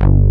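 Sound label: ANALOG BASS; Pro-Rec - Synthsonic; BASSES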